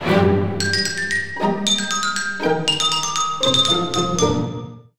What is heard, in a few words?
xylophone